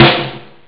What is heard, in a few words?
snare